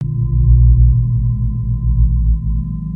ambitone

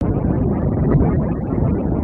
electric bubbles

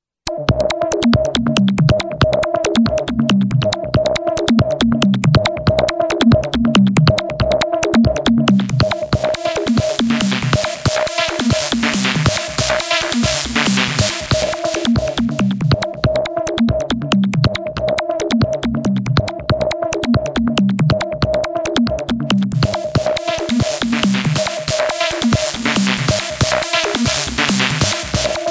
Andromeda Analog Ribbon

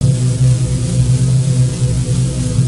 dirty drone

